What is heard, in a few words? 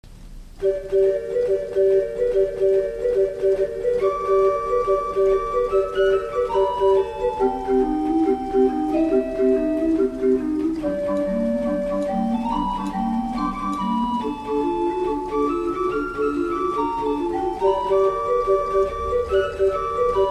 Organ Positiv Spillflote 4